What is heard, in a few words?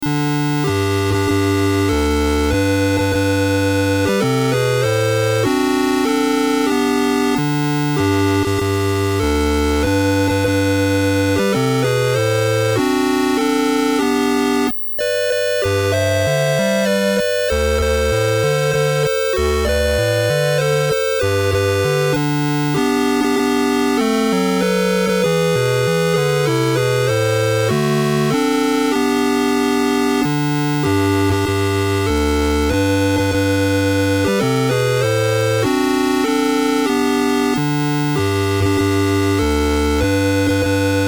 Oh Christmas Tree (Oh Tannenbaum); 27 Christmas Carols